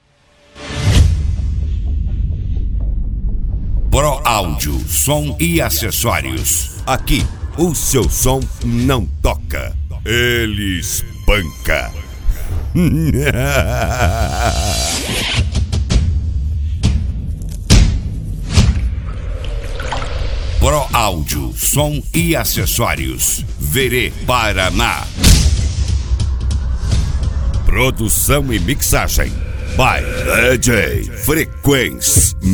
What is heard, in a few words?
funk; pro audio som e acessorios